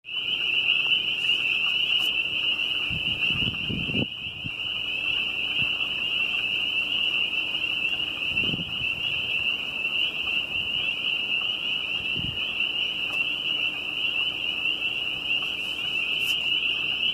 Summertime peepers

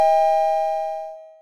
Mitsubishi Elevator

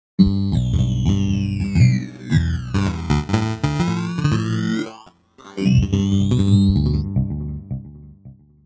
Distorted Bass

